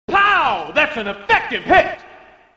pow effective hit

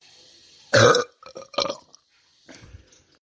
burp

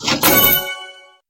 bought item

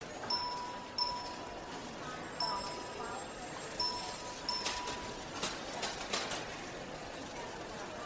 department store chimes

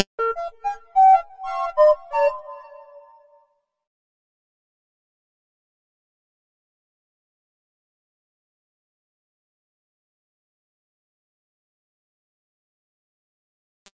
tada slowed

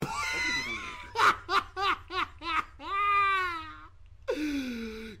bwhahaha

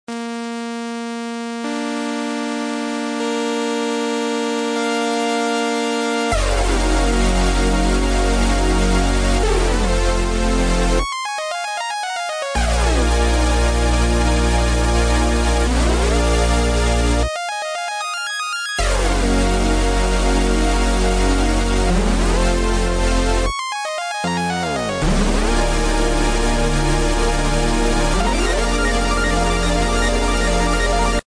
synth wave; heaven

